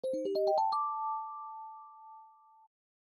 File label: Jingle Puzzle Solved